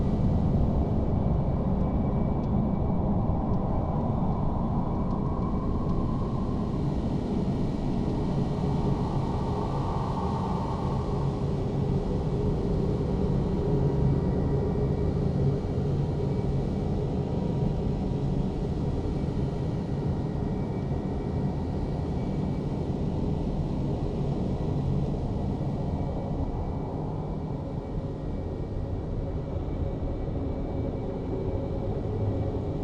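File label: ambience exterior Above The Dome Cavern Generic; Boomtown; Titanfall 2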